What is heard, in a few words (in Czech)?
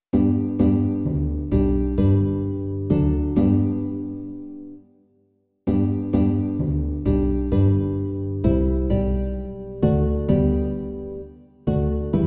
kytara a kontrabas; V ten den; Imaginativní verze